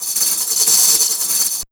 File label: 100 DRM SN, Pure Electro; USB Soundscan vol.03; AKAI